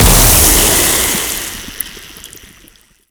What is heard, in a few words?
Infested Ancient Toxic Ground Blast